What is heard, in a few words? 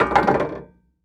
Industry Tool Shovel Set Down On Boardwalk